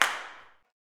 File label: CLAPS, Gospel; CLAPS+SNAP